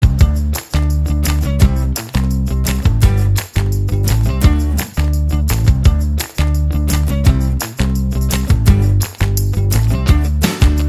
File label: Upbeat Pop Funk